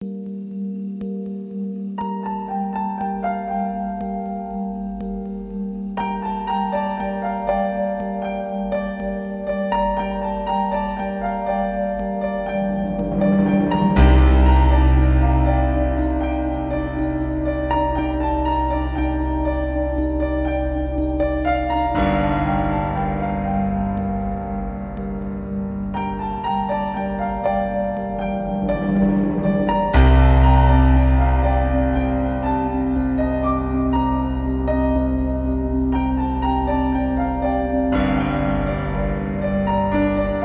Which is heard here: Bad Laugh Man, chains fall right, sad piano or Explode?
sad piano